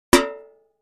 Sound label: Impact Metal Bucket; barrels buckets & cans